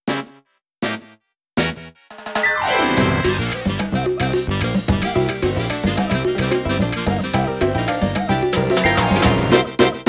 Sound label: Jingle Favorite Food